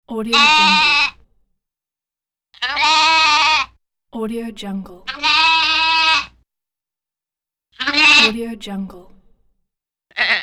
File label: sheep bleating; chiacchiostudio